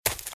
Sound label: Footsteps Leaves Sprint Left; RadwinIsland